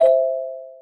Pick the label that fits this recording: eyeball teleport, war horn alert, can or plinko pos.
plinko pos